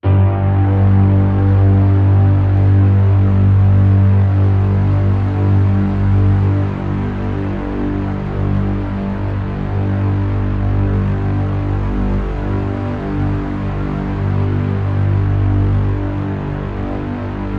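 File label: drone nice buzz G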